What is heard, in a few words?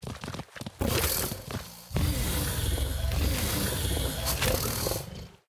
Kaprosuchus sound